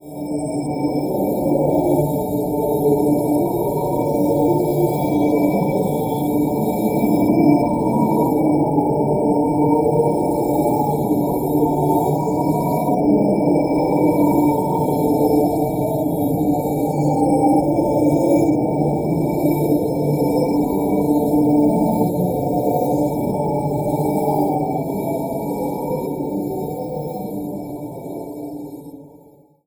ambience synth atmosphere, Dark Cinematic Soundscapes; BlueZone